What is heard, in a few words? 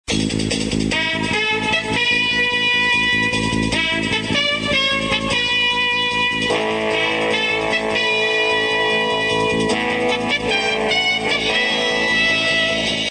news sounder; ABC Information Network